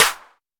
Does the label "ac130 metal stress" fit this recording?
No